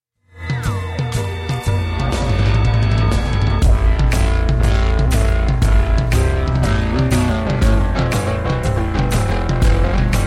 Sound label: howl